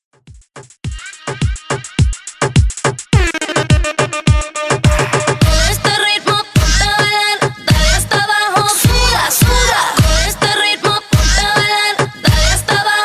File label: Hype Moombah Redrum